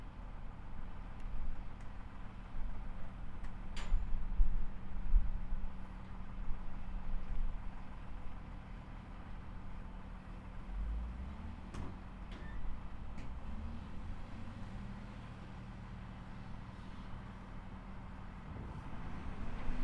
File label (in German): hafenbecken arbeiter